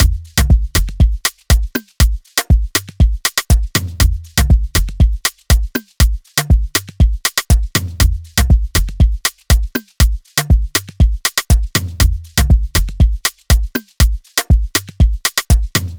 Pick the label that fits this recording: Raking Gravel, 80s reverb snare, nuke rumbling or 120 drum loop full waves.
120 drum loop full waves